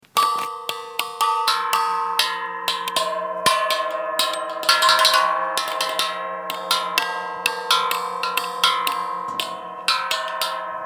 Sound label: Musical Fence